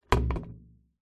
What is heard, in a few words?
musical drum tom set down